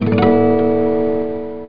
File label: clic; Invenzioni and Inventori (Eclectica Publishing) (1996)